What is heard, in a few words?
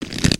Pet Dragon Scratch, creatures; creatures pets